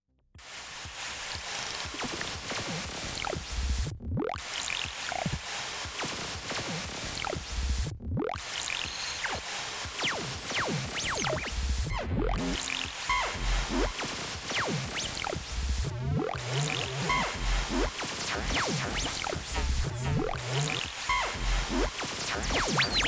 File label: Kaossilator